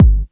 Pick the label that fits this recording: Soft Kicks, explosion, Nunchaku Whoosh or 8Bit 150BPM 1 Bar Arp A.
Soft Kicks